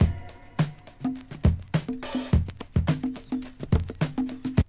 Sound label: BREAK